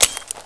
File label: pin pull, weapons; csof